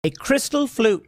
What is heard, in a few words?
a crystal flute; tim pool